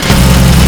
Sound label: mgsj fire start